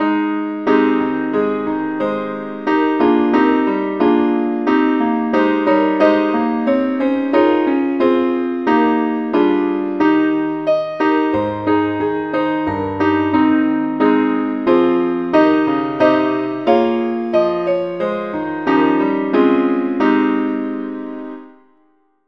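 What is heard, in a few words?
keyboard only, key A flat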